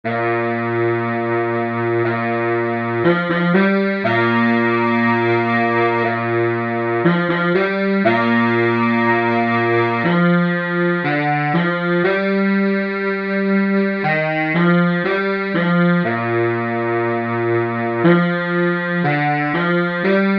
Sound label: bari sax